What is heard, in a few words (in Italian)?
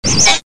suoneria botton; beep